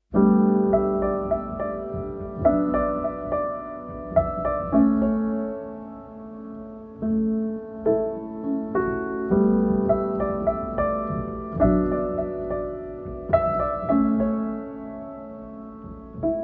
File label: Relaxing Piano Music